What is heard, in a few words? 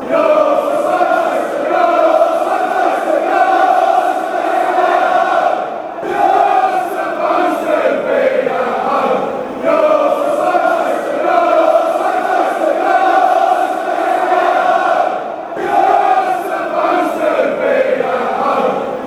youre supposed be home crawley fanchants